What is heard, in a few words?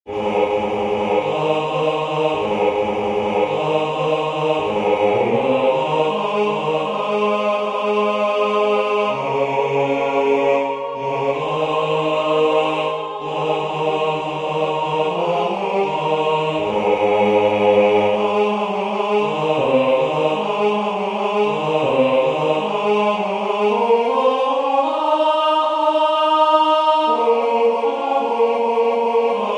Yuletide Is Here Again bass; Christmas 2024